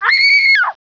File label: woman screaming